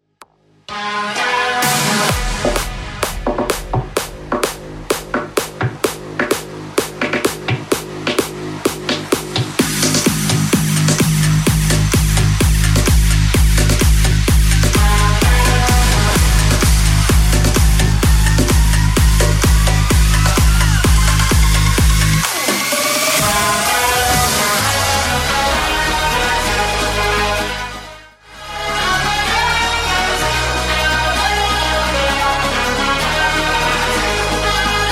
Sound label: Samba Bootleg Mashup 128bpm DIRTY